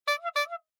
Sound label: Bicycle Horn